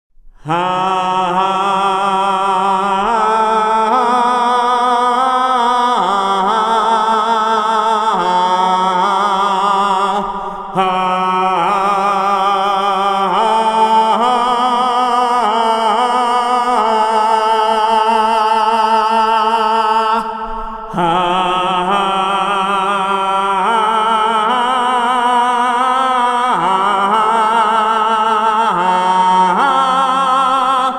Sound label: Warrior Song